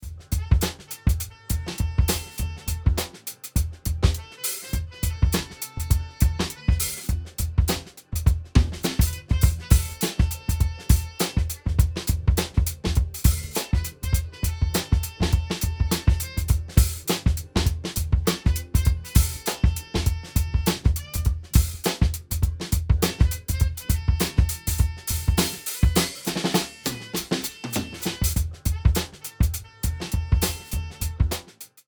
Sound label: Ableton Drum Buss Drive100 Boom50 Mix50